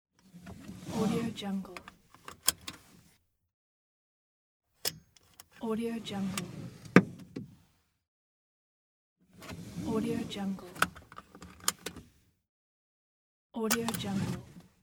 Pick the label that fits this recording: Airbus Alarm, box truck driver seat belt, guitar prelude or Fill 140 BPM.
box truck driver seat belt